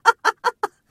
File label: laugh; irish pot luck